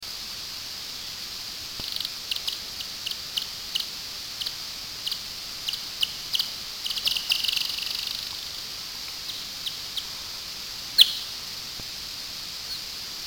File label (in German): Mopsfledermaus Balz 10x